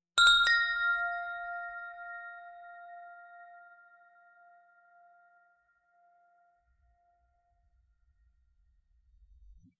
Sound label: notification